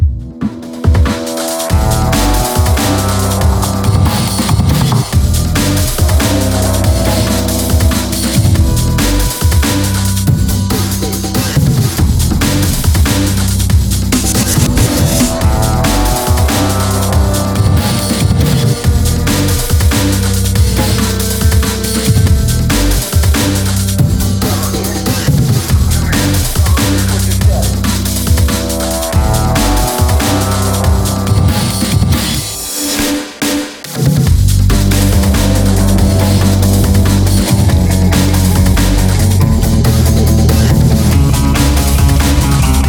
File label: game music